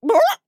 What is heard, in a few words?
Death; Mage; Radon Flux; Realm Royale